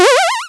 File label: spring